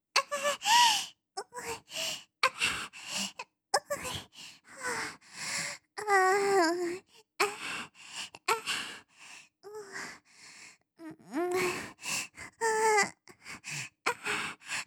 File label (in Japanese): 喘ぎ声 中